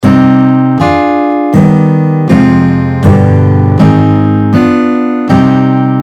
C harmonic minor chord scale

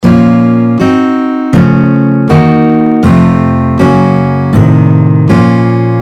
A Sharp harmonic minor chord scale, guitar

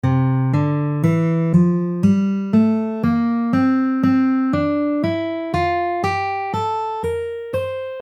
D MixoLydian Modes, guitar

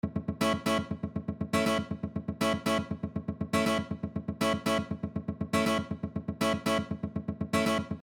Rhythm, guitar

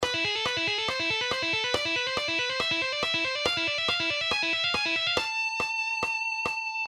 Shredding Exercise

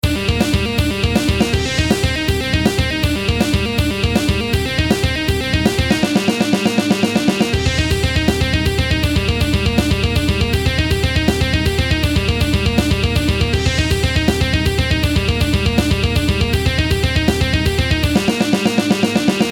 guitar tapping exercises

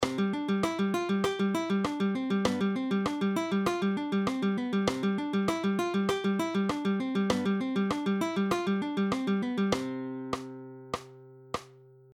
Alternating bass Fingerpicking, guitar; Guitar Plucking 7; fingerstyle; matteo carcassi music

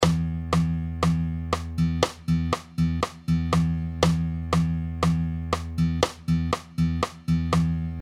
Latin Bossanova Rhythm Pattern, guitar

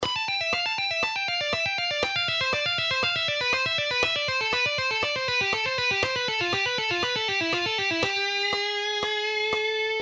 C Major or A Minor, guitar

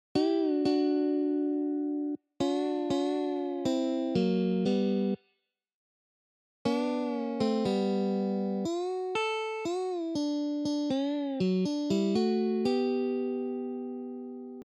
Country Licks Country Bending Solo, guitar; Arlen Roth

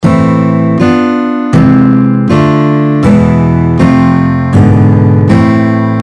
A harmonic minor chord scale, guitar

